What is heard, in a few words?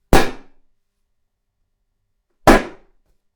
pop